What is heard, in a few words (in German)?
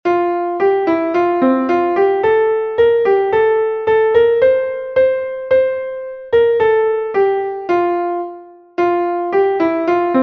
kanon klavier; wir kommen all und gratulieren